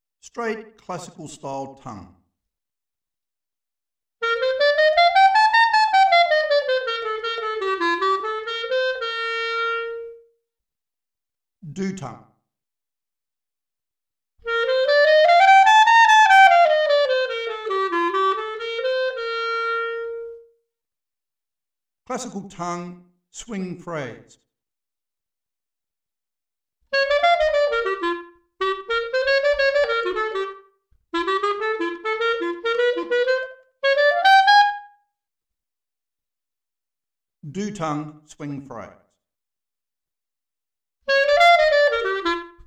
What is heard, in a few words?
classical versus doo tongue